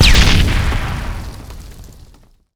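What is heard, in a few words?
grenade explode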